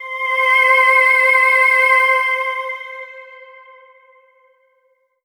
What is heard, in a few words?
Ensoniq SQ-2 Spacey Pad C7